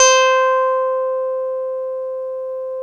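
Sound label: OB8 piano C4, OBERHEIM OB8; Big Fish Audio - First Call Vintage Keyboards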